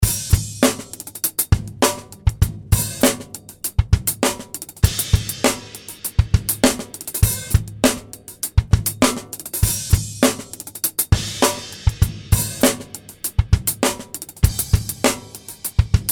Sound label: Rock Groove, Drum